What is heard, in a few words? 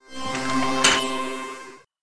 Door Lock